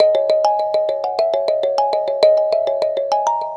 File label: Mbira Hi; Melodic; Ambient-Melodics-II